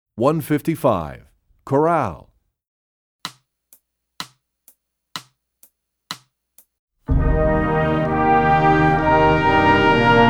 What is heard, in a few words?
Chorale, Percussion; Rubank Studies; EE2000 Book2